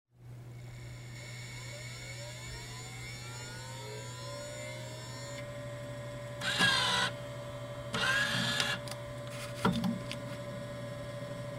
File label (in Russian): Звук рентгена